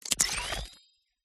WEAPONS FOLEY RELOAD PICKUP HANDLING; PMSFX

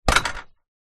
household gate drive wooden impact hit

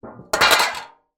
impact metal tin lid on tin; sound effects one

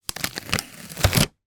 office printer label peel from table

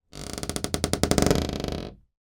door wooden internal creaking old

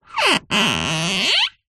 string rub wood squeak; foley

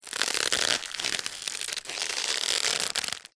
rope old stress creak sweatener; foley; zapsplat

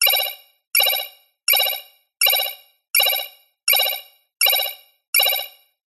science fiction computer alarm thin beeping loop; zapsplat

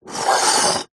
beer can empty slide table; food drink; zapsplat

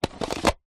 technology vr headset phone tray holder slide in

